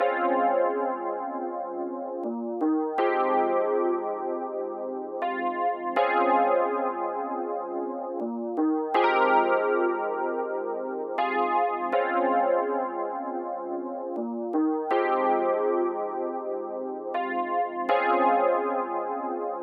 161 BPM F Min Keys; Cymatics; Obsession

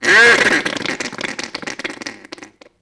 Bronx Cheer

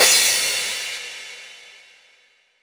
Crash Cymbal, House; House Kit; Drum One-Shots; GhostHack; Ghosthack Advent Calendar - Day 3 - House Kit

